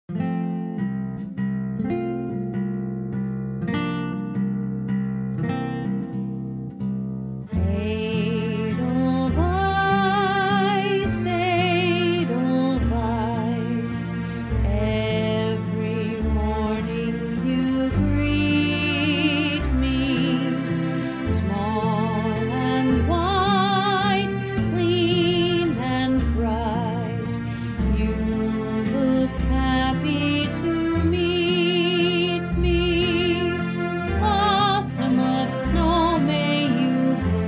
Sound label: Vocal Guide